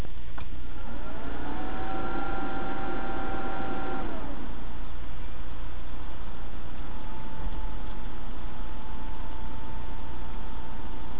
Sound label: asus startup